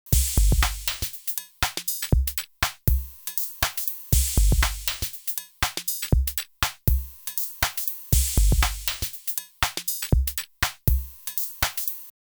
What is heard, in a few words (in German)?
Drummachine kein EQ